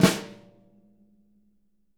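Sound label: jazz snares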